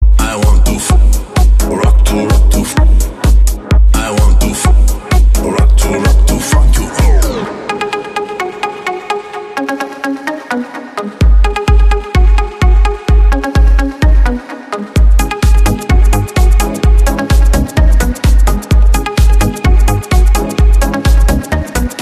funk

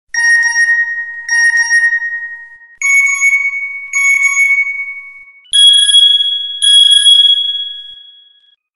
3d Nokia Msg Tune

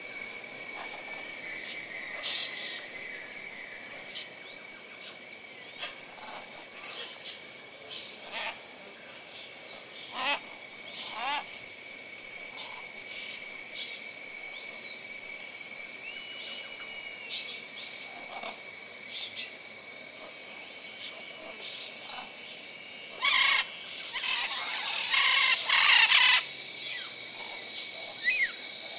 Ambient Jungle

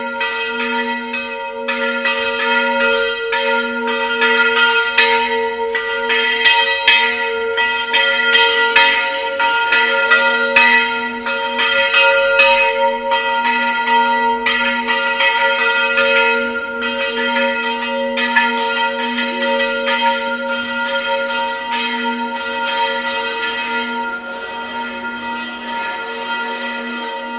bells of nd of zahle

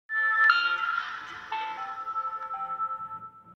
Samsung Star Power Off

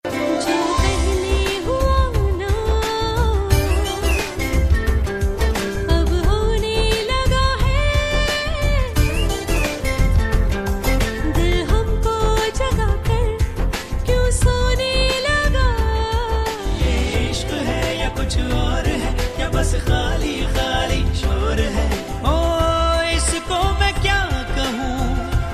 female version; ringtones

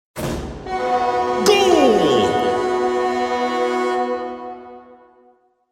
Rocket League Goal

